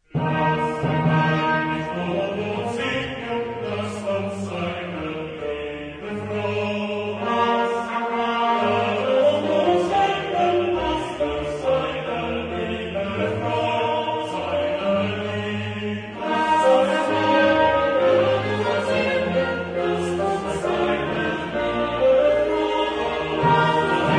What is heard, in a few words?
Hymne Fuga Lasst sein heilig Lob uns singen, chorus; Mendelssohn